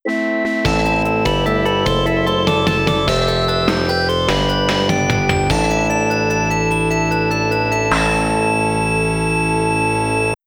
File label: Final Stage Complete FM Synth